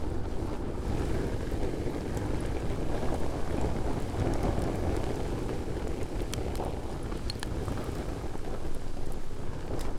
furnace; Factorio

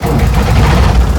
tank engine start; fight

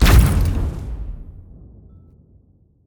robot explosion; fight

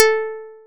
plucked, programmable speaker

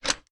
wire disconnect; Factorio

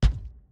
build blueprint small; Factorio

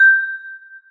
celesta, programmable speaker

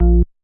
bass, programmable speaker

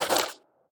biter roar mid, creatures

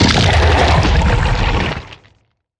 worm death big

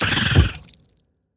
biter death, creatures

